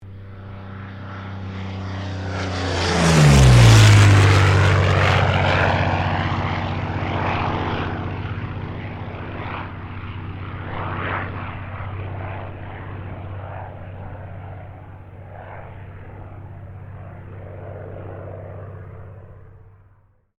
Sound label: AIRCRAFT P-40 KITTYHAWK PASS STEREO